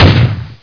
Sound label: p90 shoot